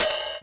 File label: HH O, DRUMS; Best Service; Alpine Volksmusik